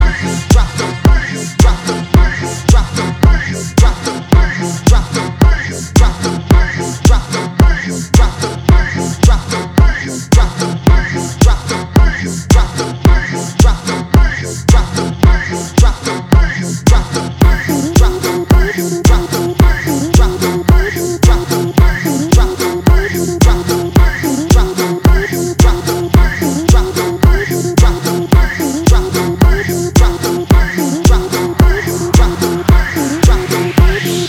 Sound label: bass drop